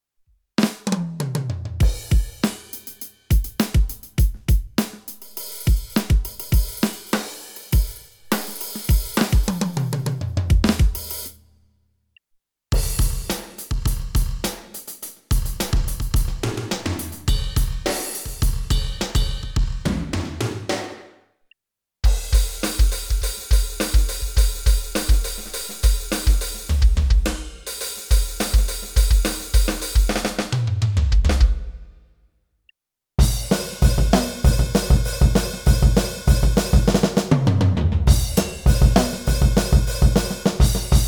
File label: Yamaha DTX